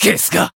damaged, jp; shigure

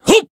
attack, jp

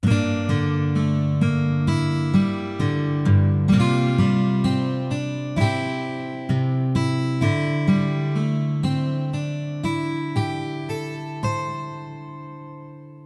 Bgm fanfare lose

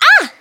damaged

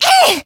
Voice attack

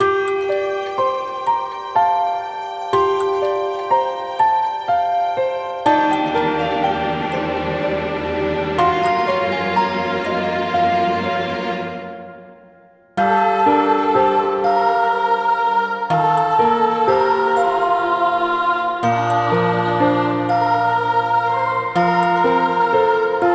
town clock tower

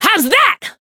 Voice attack; minalca

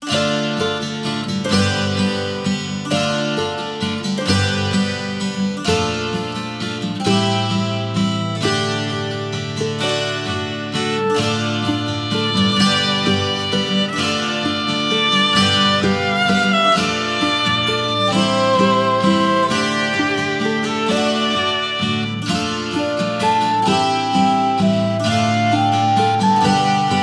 bgm song intro loop

